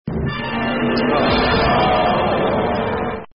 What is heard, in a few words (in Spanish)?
fallo; multiplicar con dedos